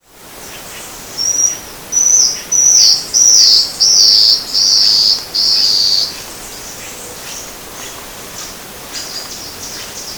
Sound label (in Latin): Drymophila squamata; Bertioga; SP; Silveira